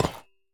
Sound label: hit, copper statue